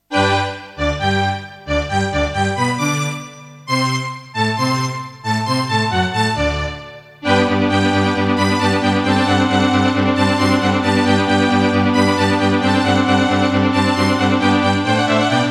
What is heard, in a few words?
Serdaco Dreamblaster X1; Mozart - Eine Kleine Nachtmusik partial; classical